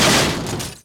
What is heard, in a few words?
crush, Impact